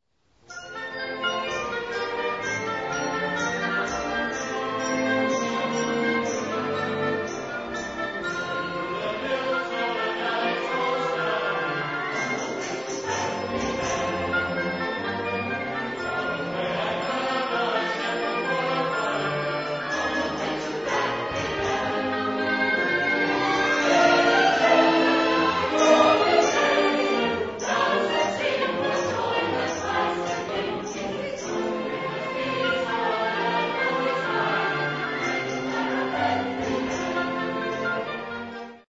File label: Pipe Carol